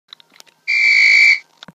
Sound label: referee whistle